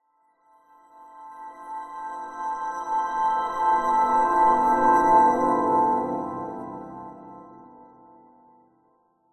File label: fantasy reversed backwards magical glissando